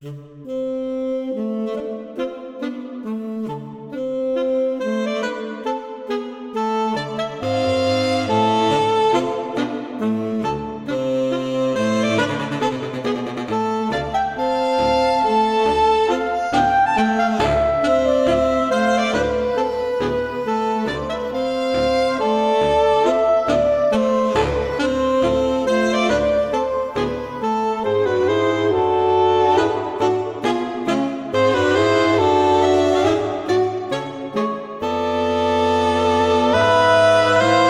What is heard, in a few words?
Electro Magnetics Saxomaphone